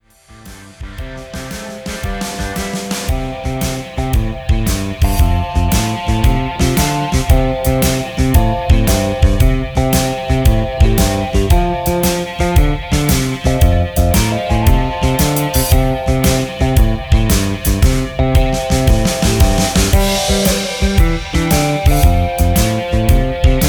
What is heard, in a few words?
Straight Ahead Blues C, trumpet